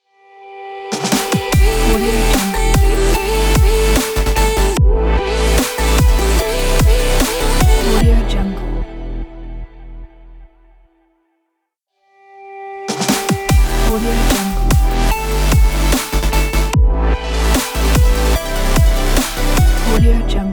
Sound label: energetic future bass logos